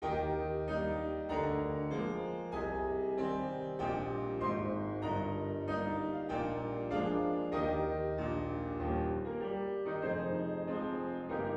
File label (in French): hymne national portugais; keil alfredo; portugueza